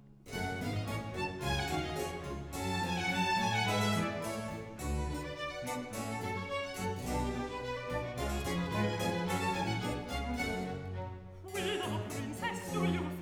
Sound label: Duet Whither, Princess, do you fly; Act 3; Handel; Theodora, HWV 68